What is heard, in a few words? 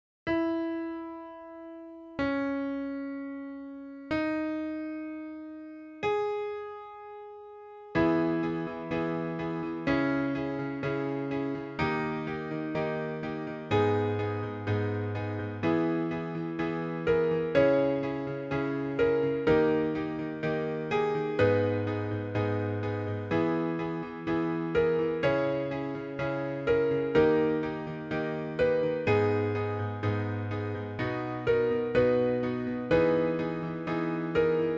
G# Minor song